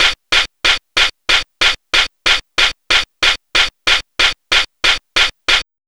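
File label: MPC4 hi-hat; USB Soundscan vol.02; Underground Hip Hop; AKAI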